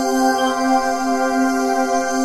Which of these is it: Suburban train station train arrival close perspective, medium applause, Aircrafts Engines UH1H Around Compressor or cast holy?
cast holy